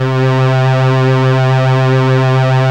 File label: SIEL, String Machines